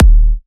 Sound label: Punchy Kick